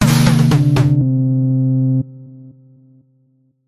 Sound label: 8 bit game over arcade 80s simple alert notification game; epic stock media